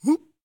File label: jump; ebiten